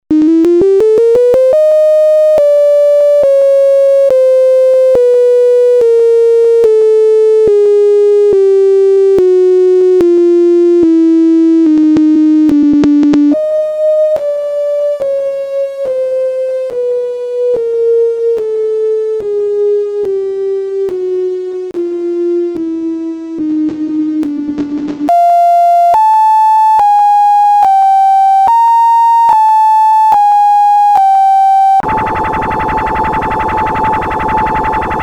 Sine Wave

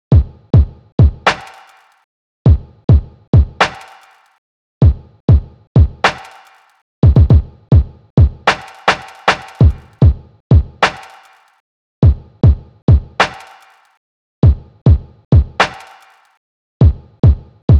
Sinewave Project

